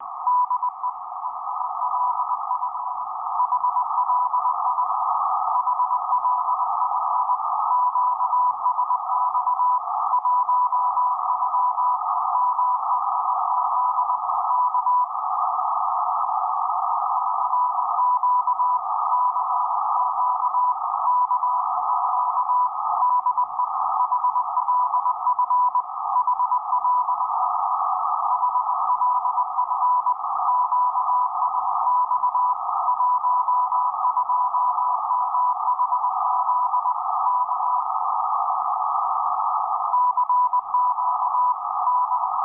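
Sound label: 12916,5 cw, 12916.5kHz South Korea, frequency marker, Marine Audio HF; HLF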